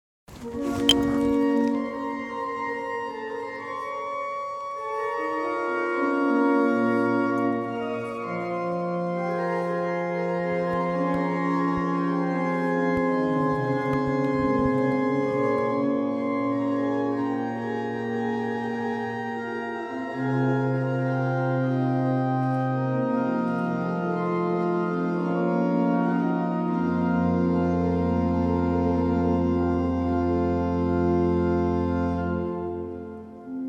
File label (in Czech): zvuk varhan